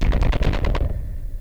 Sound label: crunchy